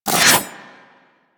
Melee Whoosh; Warframe; Corpus; Arachnoid Hunger; Abilities

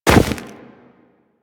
Run Deep Snow, Footsteps; Warframe; Operator

